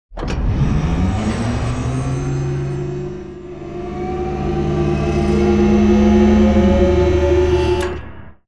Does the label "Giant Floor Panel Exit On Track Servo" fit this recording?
Yes